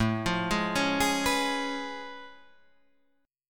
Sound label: A Augmented Major 9th A down